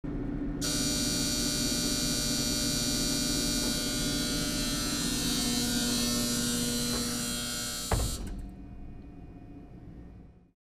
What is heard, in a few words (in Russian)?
zvuk otrkrytiya i zakrytiya dverey lifta